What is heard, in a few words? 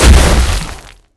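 boss explosion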